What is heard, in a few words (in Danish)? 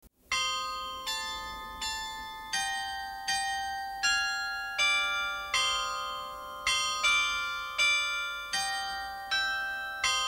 melodi nu tændes tusind julelys